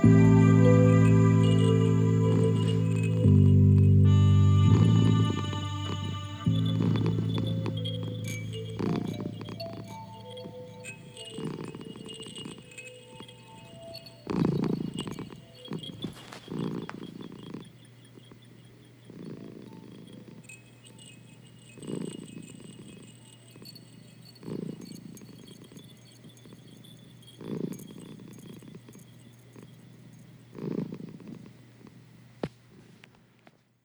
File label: Pads and Purrs; BB 2HTC Samples Vol 4